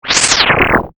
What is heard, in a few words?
Sweeper, Chopper; Sweeper Sound Effects; Sound Design; SoundEffects-BlastWave